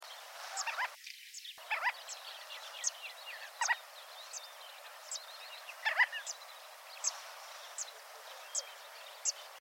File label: Gull-billed Tern